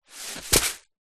food rice dry in plastic container set down on soft surface